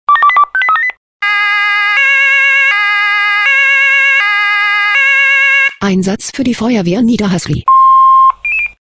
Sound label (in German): SMS Alarmierungstone; FW Niederhasli